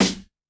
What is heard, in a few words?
Snare A Hit, Bmin; Hip Hop; Hip Hop Exotica; Apple Loops; Big Fish Audio